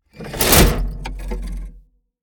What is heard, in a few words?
Unstable clock brutal impact